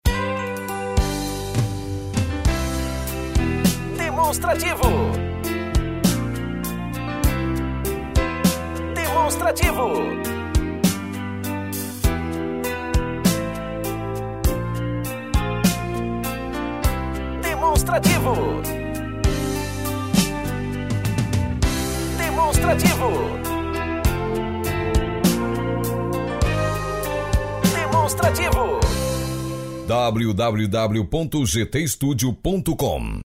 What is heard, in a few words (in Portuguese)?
tema espera